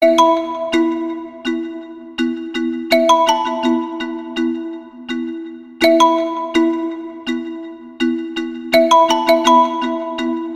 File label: Mixed Ring